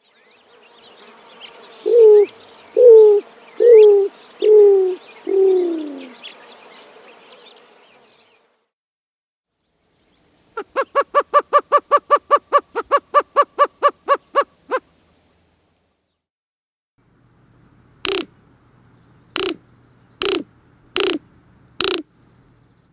Greater Roadrunner

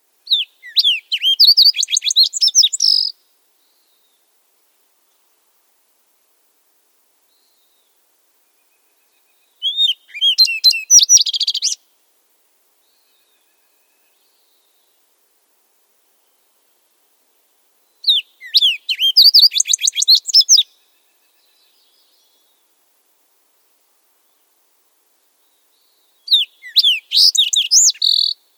Fox Sparrow

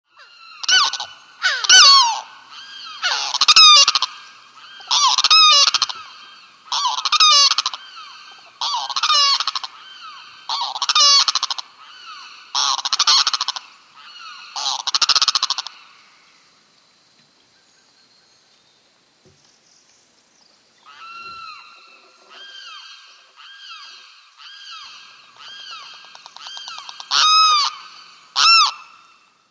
Limpkin

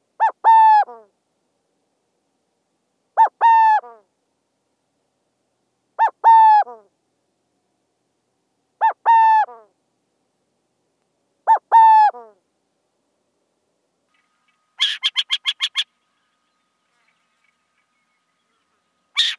Burrowing Owl

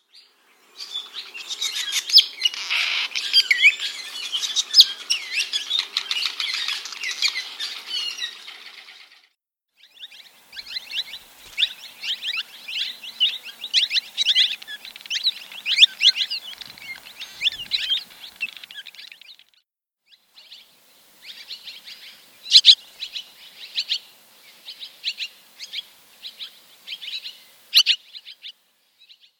Cave Swallow

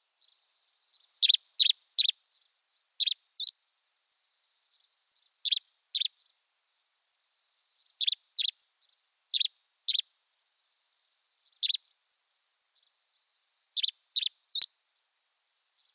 Gray Flycatcher

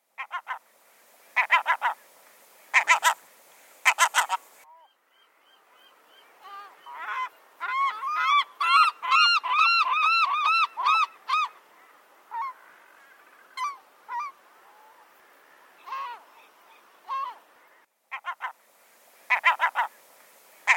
Western Gull

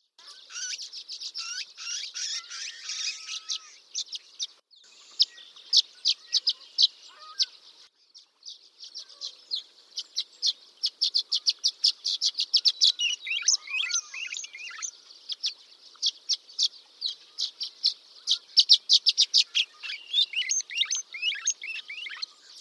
Violet green Swallow

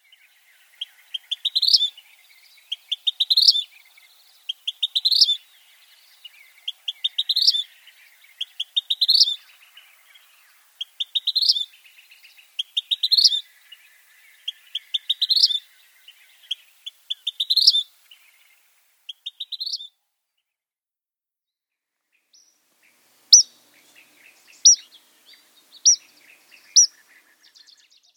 Vermilion Flycatcher

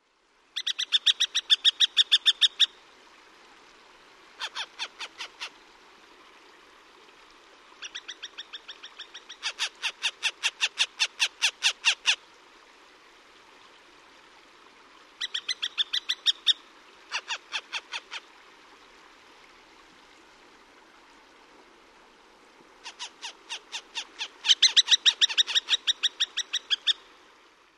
Northern Harrier

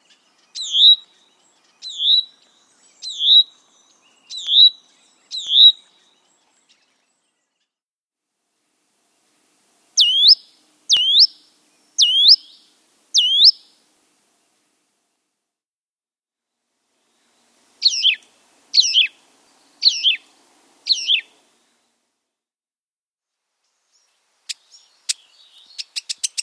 Huttons Vireo

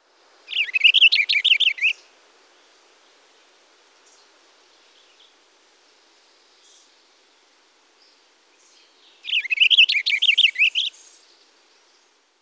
purple finch

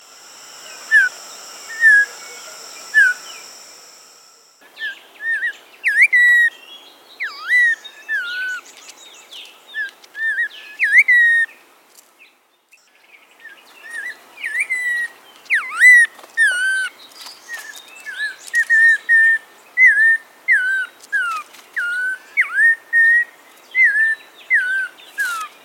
Audubons Oriole

